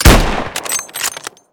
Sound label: Kar98 Shot; Angry Goy II; SoundEffects